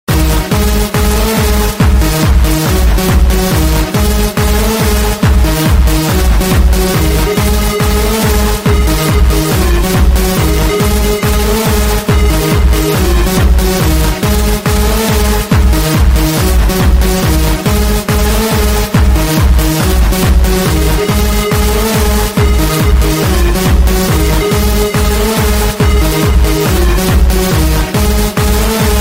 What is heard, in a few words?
Free Jumpstyle Beat